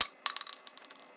shell; zombiepanic

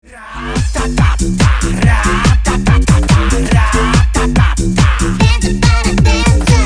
win; MusicRoundEnd

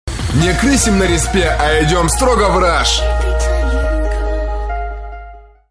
round start

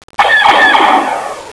sentry

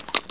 reload, weapons; zombiepanic

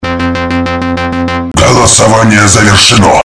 mapchooser end

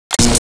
kit use

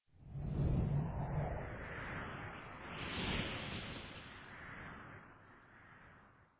gust; zp basement

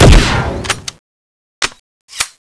railgun, guns

